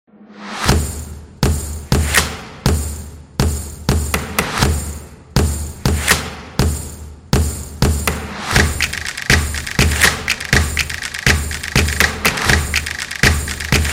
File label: Stomp Intro